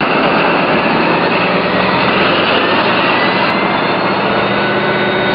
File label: acc